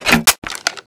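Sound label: weapon foley ak47 gp25 grenade chamber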